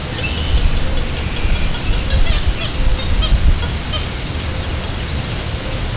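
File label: Purple gallinule cackle; National Marsh Bird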